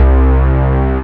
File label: BAS, Eurotech; Sounds Good